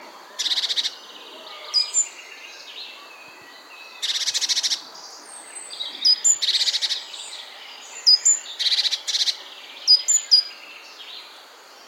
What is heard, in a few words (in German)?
Kohlmeise verärgert